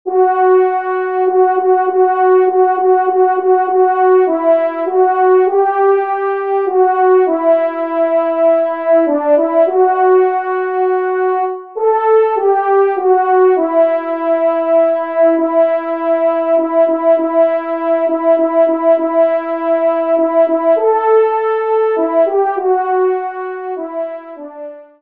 Offertoire TRP; Messe d'Estival

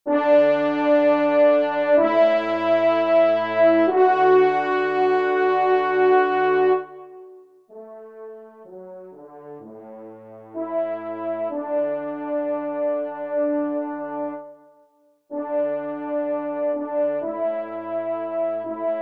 trompe; Nemrod; 1e Trompe; Fernand Andrieu

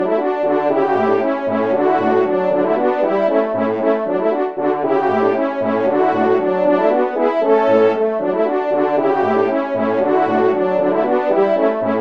Trio Cors

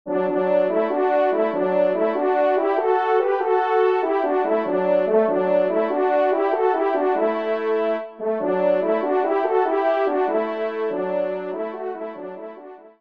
Reveil

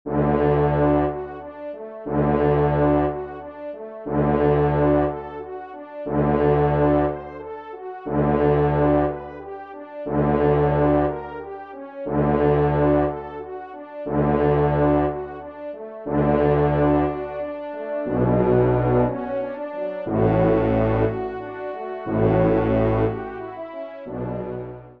Quatuor, cor; Schubert Ave Maria

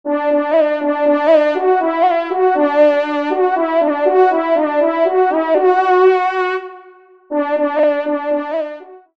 trompe; Penthievre; Cote Armor

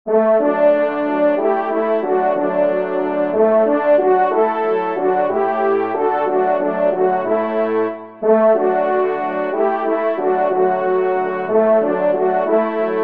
Priere Saint Hubert, trompe cors

